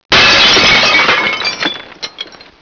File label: GLASS; Death from Above